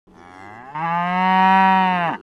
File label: Feed ant Heifer